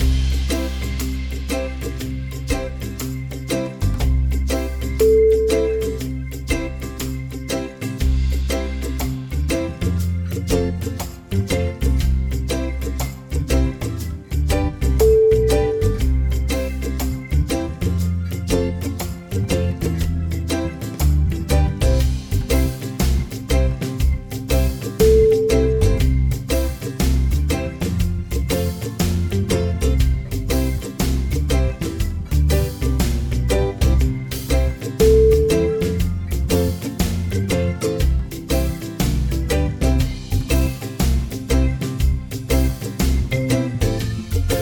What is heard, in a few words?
reggae